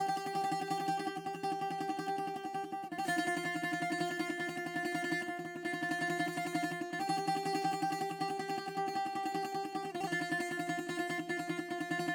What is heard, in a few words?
bouzouki